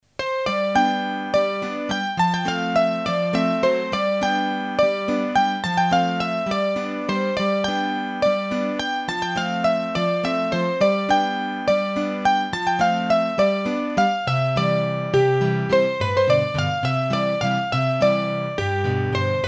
Lou Diziou Be Pierrou, hurdy gurdy, Bourees d'Auvergne; Massif Central